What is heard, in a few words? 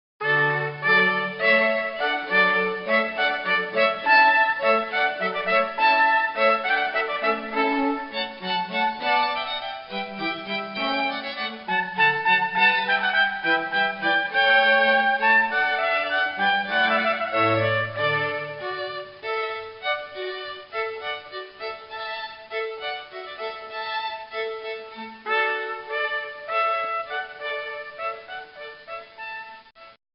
hornpipe